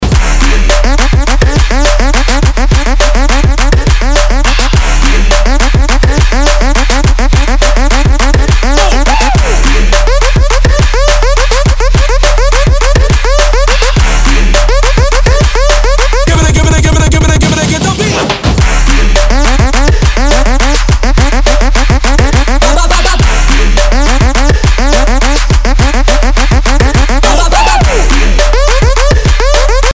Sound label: Trap Beats; Future Trap